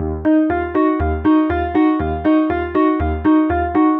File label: Synth Pattern; Electrocution